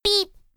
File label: boop; tf; a hat in time; hat kid